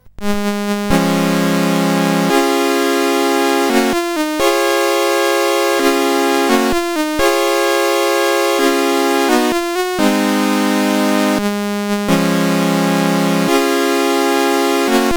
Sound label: Music, CSG8580R5